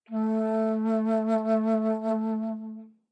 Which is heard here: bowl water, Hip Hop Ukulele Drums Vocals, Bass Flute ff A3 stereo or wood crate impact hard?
Bass Flute ff A3 stereo